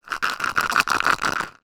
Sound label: raisins shake in plastic bowl